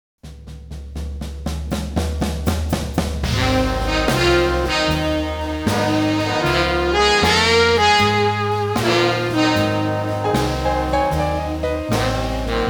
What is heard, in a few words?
closing blues, big band